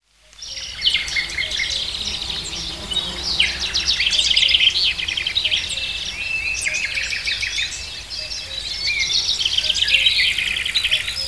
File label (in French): coucou et autre oiseaux